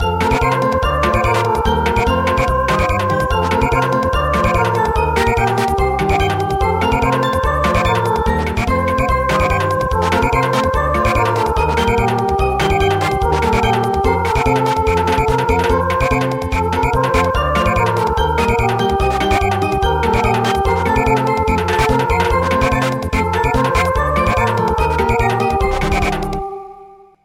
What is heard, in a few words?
Samba; Lorig